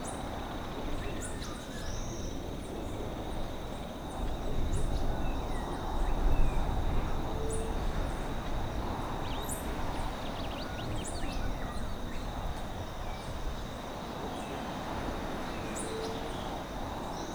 amb spoons island top

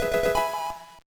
Fanfare Item Get; Pokemon LeafGreen; gba

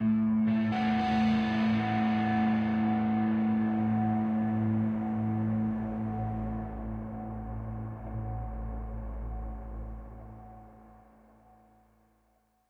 Escape Stinger; Grotto Pris

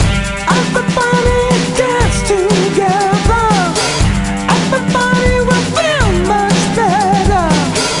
Blues Rock; gamerip; Happy Feet Two; nds

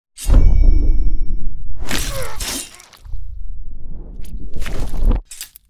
slowmo; shirigami

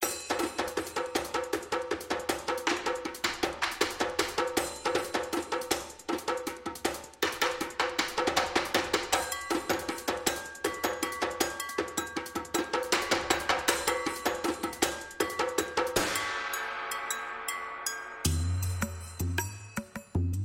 Puzzle (Percussion Only); Unused Cascade Glade Puzzle

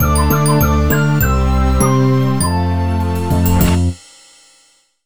Jingle, BPM 100; Super Scribblenauts 2010 NDS

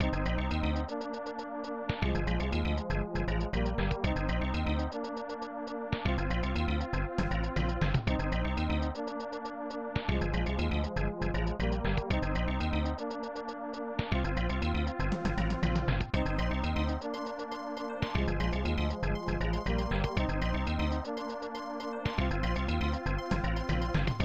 Funky Conversation; Shadowrun; snes

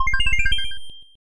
Big Item Fanfare; magical pop'n

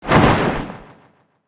swipe windup

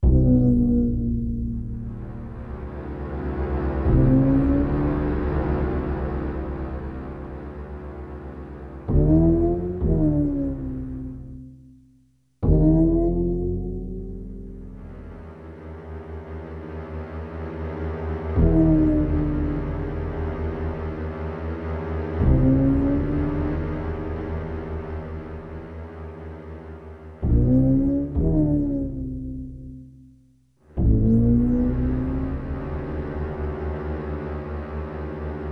Low Boings

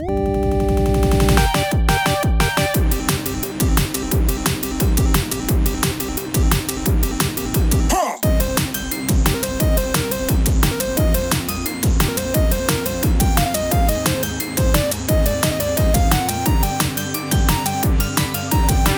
Drum'N'Bass; SGFR presents Super Star Symphony 2021

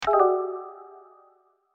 Spin Wheel Button Failed; My Cute Commissar

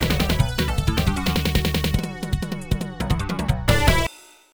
Game Over Jingle; burning dragon pc 9801 opna

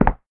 Tile short; Devour 2021 gamerip pc macos

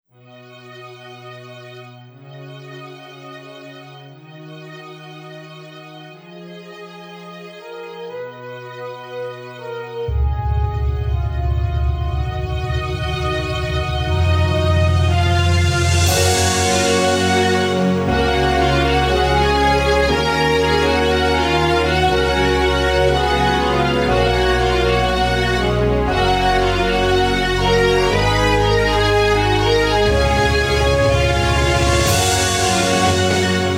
Orchestra; maji de watashi ni koishinasai original sound track; maji enbukyoku

